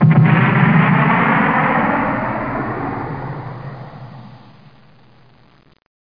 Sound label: DEATH; TERACIDE